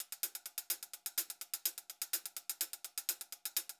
126 HH, Pop-Rock Drumloops; USB Soundscan vol.37; AKAI

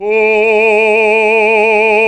BASS, Roland; Sound & Vision - Gigapack II CD 1; GREG. BASSES

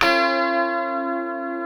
B 3 FUNK CHORDS, Guitars; Spectrasonics; Hans Zimmer Guitars VOL-1

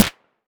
Clap Pairs

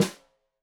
Snare Stereo, Drums; Deeply Sampled Drums; SMDrum

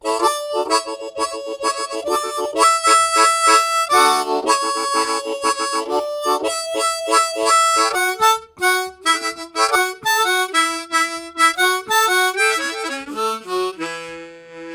Soft Vamp, Harmonica Riffs; Zero G

